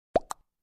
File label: new chat message